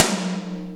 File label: Toms, Real